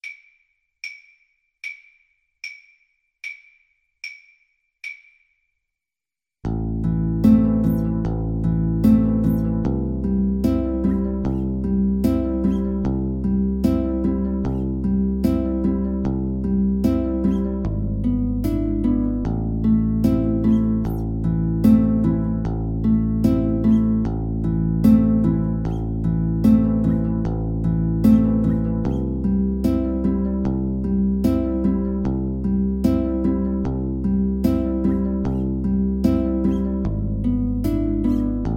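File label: el condor pasa Oboe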